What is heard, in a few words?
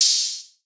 Open Hihat; Eternity; Cymatics; Lofi Starter Pack